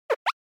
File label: Anime Motion Low